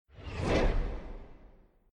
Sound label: Bonus show Dice; Realm Saga